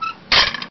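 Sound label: gate close, house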